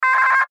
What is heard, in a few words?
Radio Morse Code; Weak Signal Letter B; Warfare; Blastwave